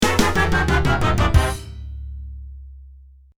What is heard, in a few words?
bgm bunny fail